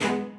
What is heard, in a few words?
strings